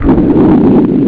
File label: Final Boom; Grunt